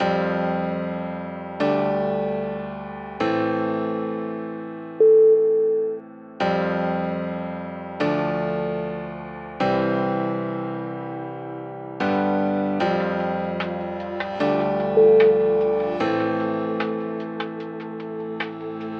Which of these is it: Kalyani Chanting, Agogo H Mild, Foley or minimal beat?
minimal beat